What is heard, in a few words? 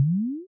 Jump